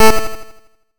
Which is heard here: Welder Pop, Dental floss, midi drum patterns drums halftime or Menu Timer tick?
Menu Timer tick